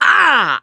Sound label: hurt critical, mechanic; voice